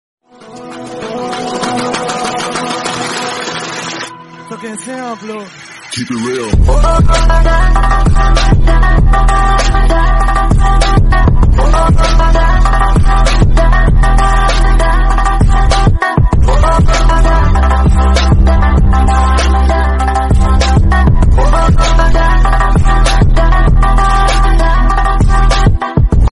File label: bad boy attitude bgm, ringtone